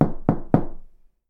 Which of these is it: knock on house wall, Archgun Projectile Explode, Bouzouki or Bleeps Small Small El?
knock on house wall